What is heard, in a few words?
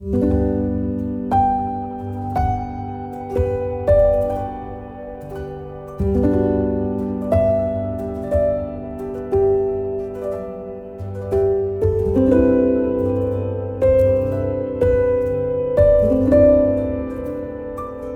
ambient cinematic; ambient cinematic vol1